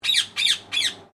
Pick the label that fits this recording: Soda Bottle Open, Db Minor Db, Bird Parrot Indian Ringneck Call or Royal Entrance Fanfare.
Bird Parrot Indian Ringneck Call